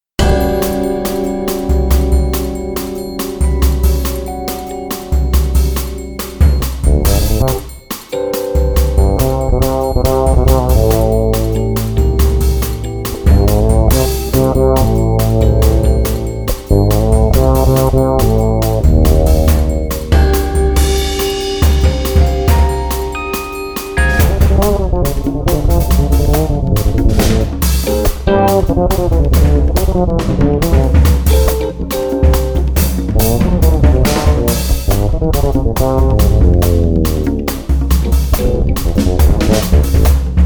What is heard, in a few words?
me-20b patch; ME-20B Bank 3, No. 2; Chorus and octave sound, suited for finger-picked solos.